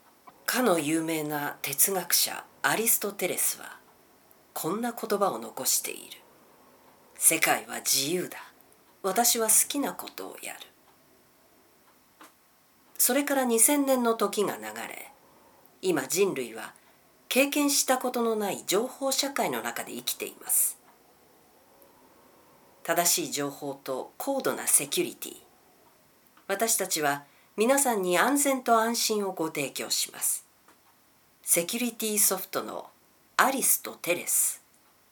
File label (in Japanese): 落ち着いた